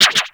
Shield Deflect; Hayden

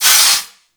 Forest Water Pump Engage; Props; Warframe; Grineer Forest

